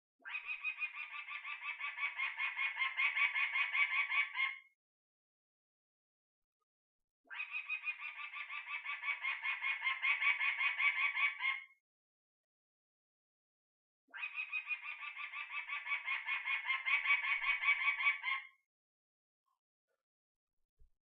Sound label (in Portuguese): Canto do Falcão de Cooper